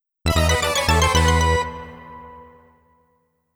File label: Player Miss - Midi Roland CM-64 Version; Castlevania Chronicles; Akumajo Dracula